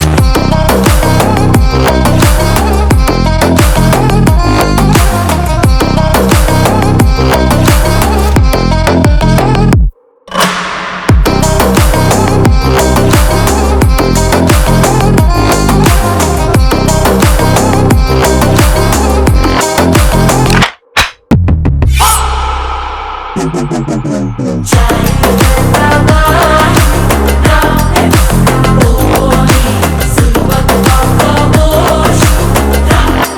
Trance BGM

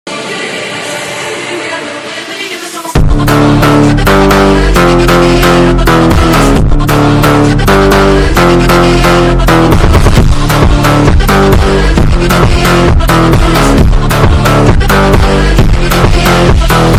Ringtone

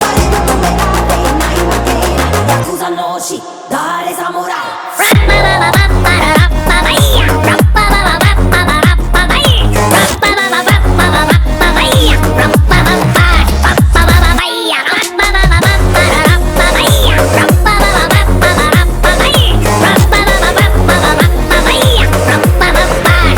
Japanese Ringtone

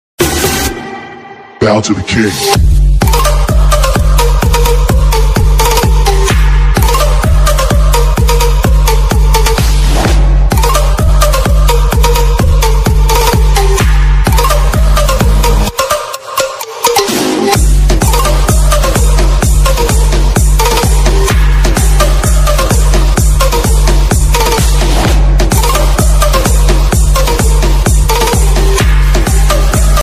Trance Ringtone

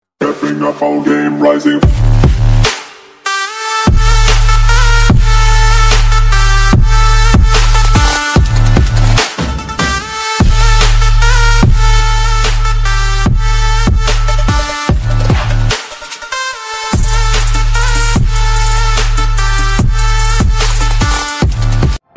Ringtone

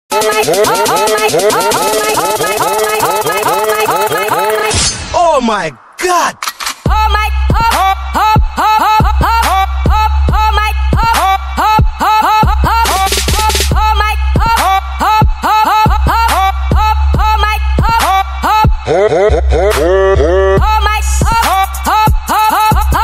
TRAP RINGTONE

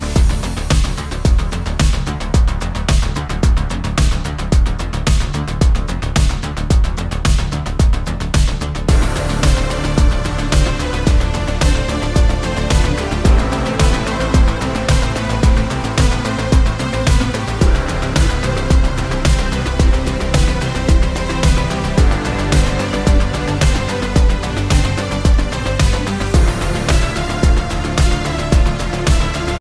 BGM Ringtone

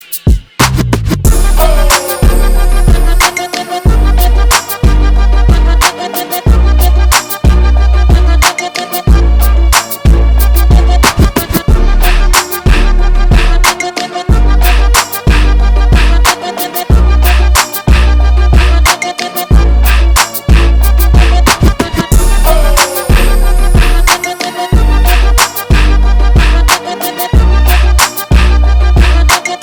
Ringtone

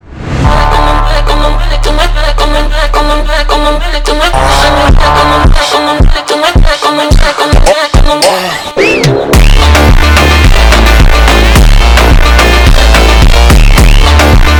FUNK Ringtone

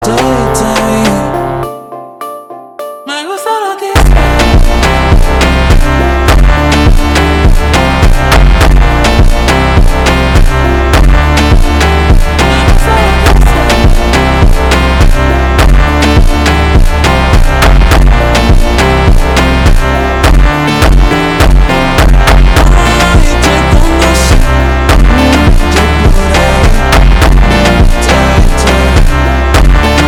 Ringtone Super Slowed

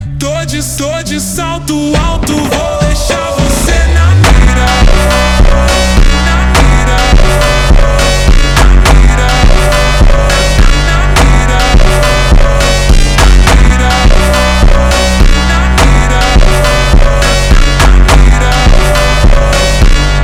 SLOWED Ringtone

